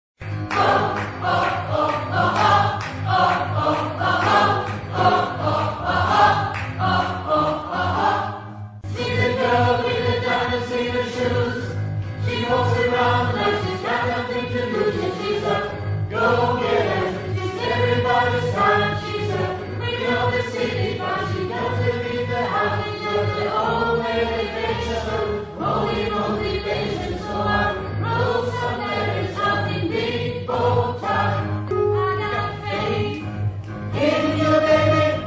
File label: tenor